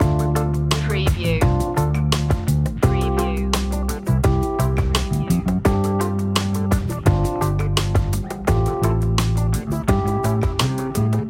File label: sweet honey loop